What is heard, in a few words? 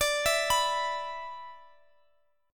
B-Minor Double Flat 5th